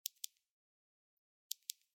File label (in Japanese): スマホの音量ボタンの音